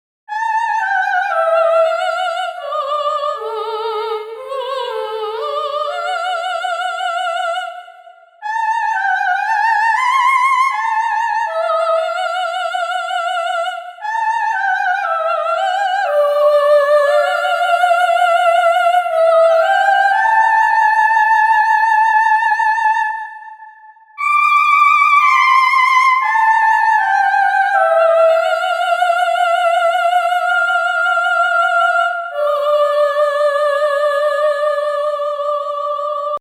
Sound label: vox